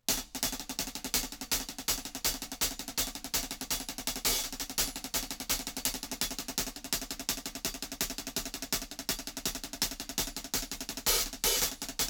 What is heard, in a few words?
clack